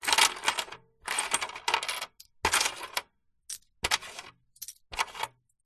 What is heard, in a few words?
money coins slide out by hand from plastic cash register till drawer